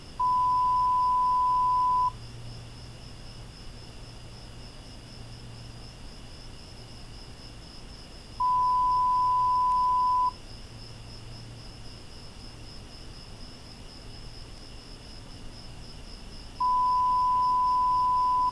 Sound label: Noise MS Zoom